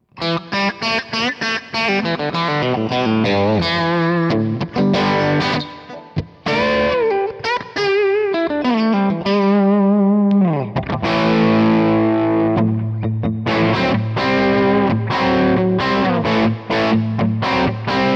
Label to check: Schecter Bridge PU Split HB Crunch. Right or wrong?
Right